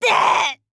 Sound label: Die